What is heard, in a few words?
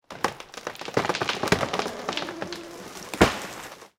tree creak; War Thunder